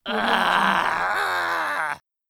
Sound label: goblin angry